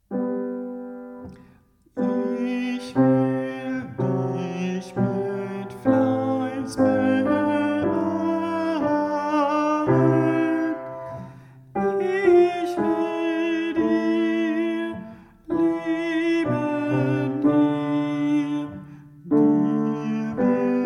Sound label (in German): Ich will dich Tenor